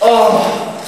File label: stun; billy herrington; slender